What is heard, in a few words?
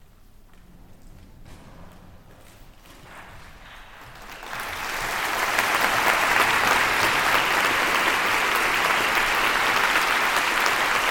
conductor entering